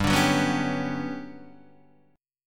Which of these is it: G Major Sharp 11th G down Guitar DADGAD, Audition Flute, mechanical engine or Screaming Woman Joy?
G Major Sharp 11th G down Guitar DADGAD